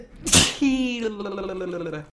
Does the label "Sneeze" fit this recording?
Yes